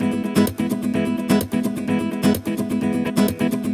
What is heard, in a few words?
Nylon Guitar E maj, 128BPM; Vengeance Samples; VEH3 Nylon Guitar Kit 1